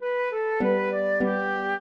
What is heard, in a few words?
trio, flute harp; Mozart